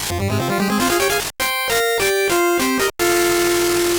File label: Mono Stereo Mission Start